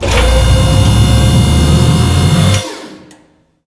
Machine

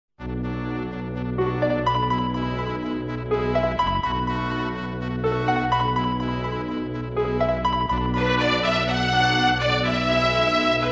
bgm

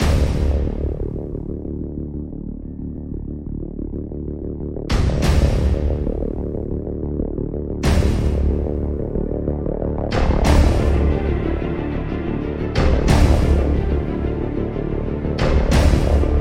bgm

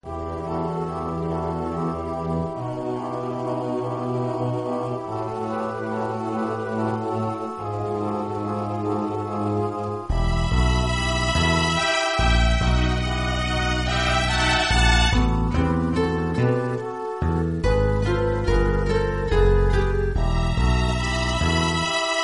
Mysterious Caves

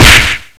hit

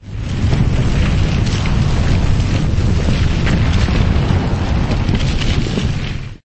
Fire; LiveOrDie

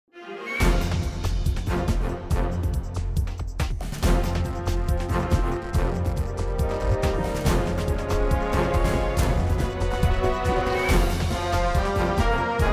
bgm

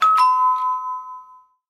dingdong

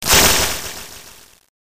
Attack

